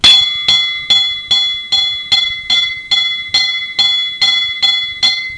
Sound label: 2 BELL; WinDesk 95 - An MPC Encyclopedia